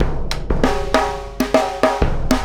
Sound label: Big Drum and Snare Fill; Sonic Foundry (Sony Creative Software); Essential Percussion; Drums; Big Drum Combos